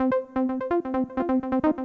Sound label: synth, 129 Cmin; Big Fish Audio; Hardcore Breakbeat Action